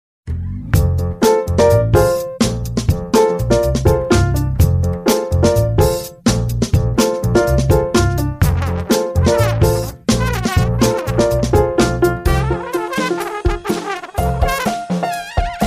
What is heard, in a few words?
Swoosh